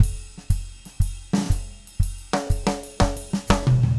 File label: Red Maple Groove 120, DW Red Maple Kit, Vital Drums; 120 BPM